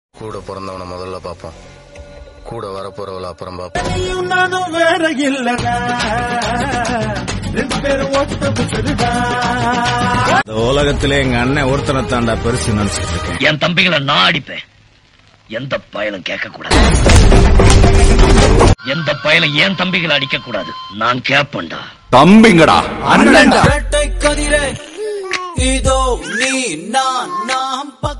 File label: brother song tamil